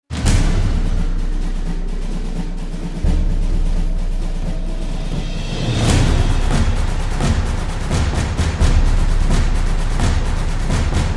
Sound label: Drums Only Version